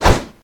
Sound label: spider soldier sword